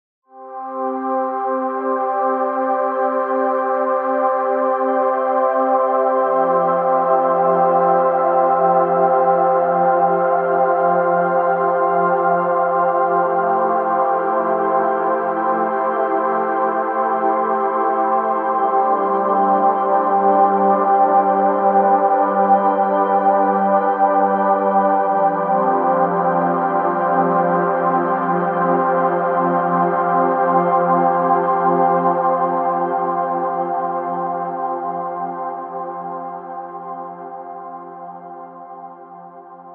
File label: Majestic Air Pad